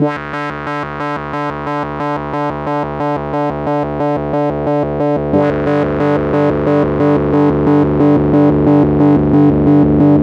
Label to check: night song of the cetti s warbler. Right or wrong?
Wrong